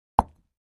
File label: Patio Block Impact, concrete & brick